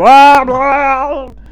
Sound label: death; gusanos promode